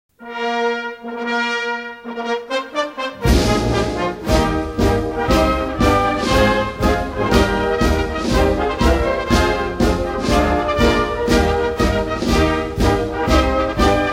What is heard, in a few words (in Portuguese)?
Marcha dos Marinheiros Instrumental; banda